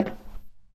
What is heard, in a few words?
metal, Footsteps; zps; Foley